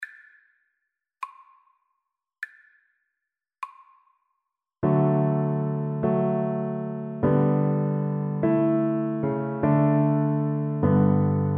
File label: DB, Double Bass